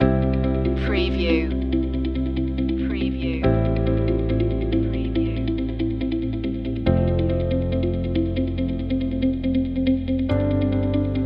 new point of view loop

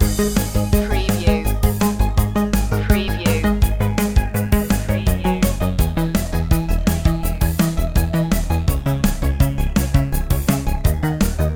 antiquated loop

